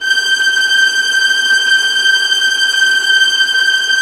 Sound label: VIOLINT A, Synclavier Strings; ILIO